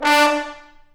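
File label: trombone; Roland L-CDC-01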